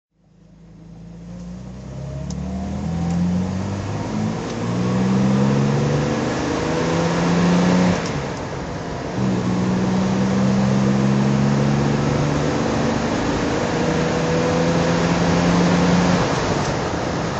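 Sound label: jag xk150dhc drive, Engine sound driving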